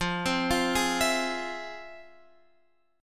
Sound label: F Minor Major 7th, Guitar Open F, chord; FmM7 arpeggiated; JGuitar